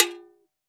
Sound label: AFRO AGO, AFRIC AGO GO